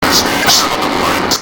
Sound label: rbuzz; slender